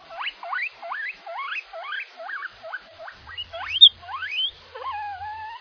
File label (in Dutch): piep, cavia